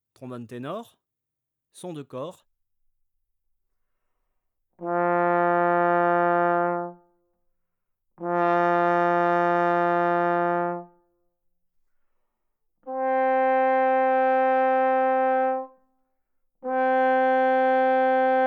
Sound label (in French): ténor sons de cor, trombone; Textures; Sons sourds; traite orchestration; trombone tenor trombone basse